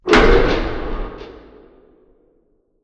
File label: Assembly Conveyor Start Close; Boomtown; Titanfall 2